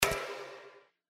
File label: Intro Spin Click; SLOTS; Chinese New Year Deluxe